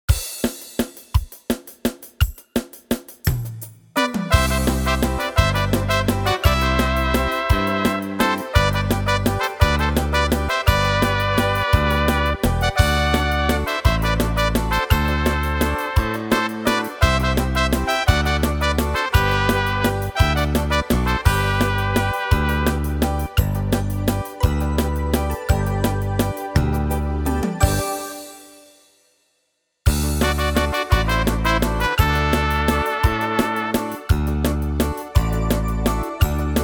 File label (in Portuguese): playbacks